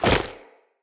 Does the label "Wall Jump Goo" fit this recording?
Yes